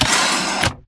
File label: Stalker Mvmt Servo Damaged Headpivot Medium; Titanfall 2